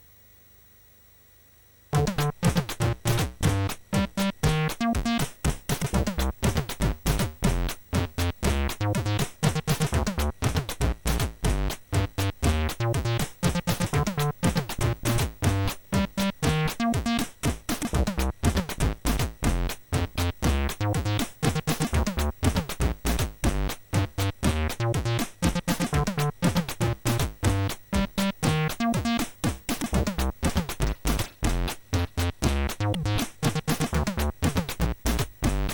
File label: drum&bass sequence; prophet64